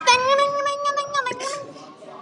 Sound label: meme song; indian